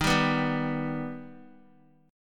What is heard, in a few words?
Eb Augmented, Guitar; strummed; 4F,4A,5C,5G,5C,6E